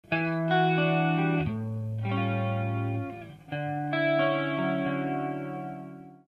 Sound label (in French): chorus; On le retrouve très souvent en jazz